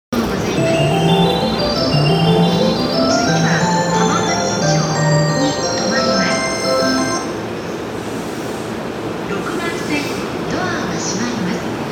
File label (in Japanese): 京浜東北線東京南, train sounds